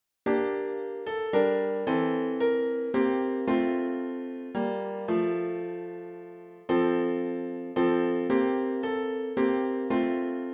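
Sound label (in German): Leise rieselt der Schnee Klavier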